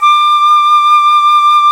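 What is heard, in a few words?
PICCOLO; Roland LA; ORCHESTRA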